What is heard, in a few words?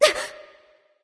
surprise; smite; voicepack; hel